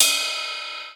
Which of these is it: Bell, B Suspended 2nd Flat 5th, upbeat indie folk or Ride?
Ride